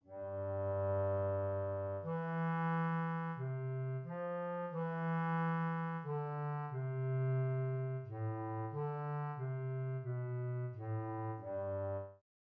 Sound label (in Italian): Fughe; dal Kafka Skizzenbuch; Prima fuga